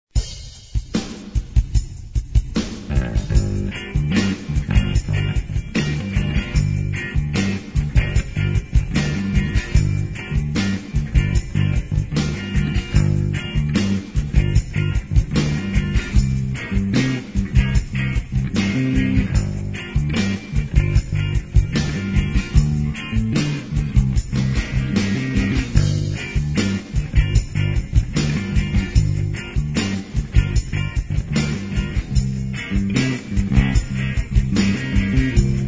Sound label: funky